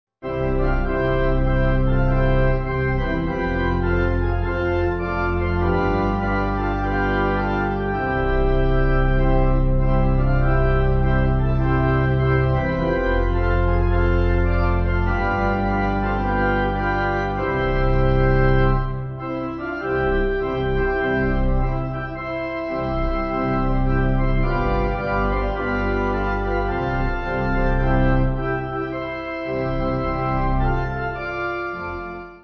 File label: Pipe; L.E. Jones